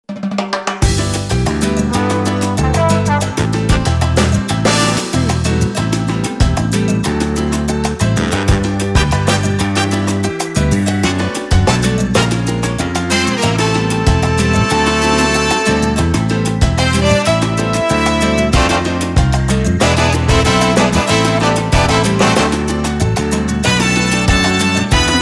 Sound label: salsa spirit 60 second